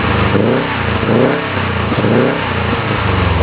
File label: engine